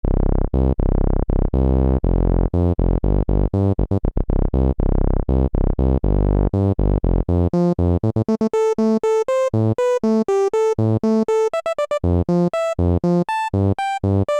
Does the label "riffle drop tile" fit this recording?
No